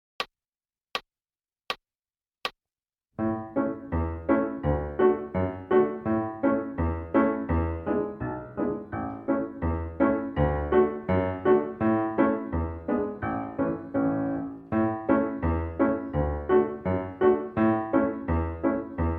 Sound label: Piano; Boil 'em Cabbage Down